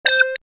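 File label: Synth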